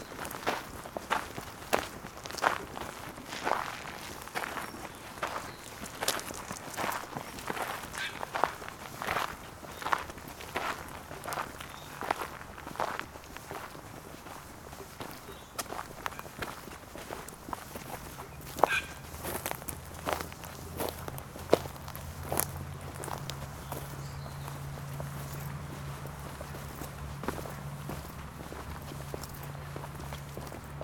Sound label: Footsteps Bike Trail Gravel And Grass Joggers Passing